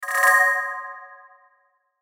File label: Hit Bells Chord Delay; Blastwave